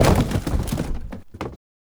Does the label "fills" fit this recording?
No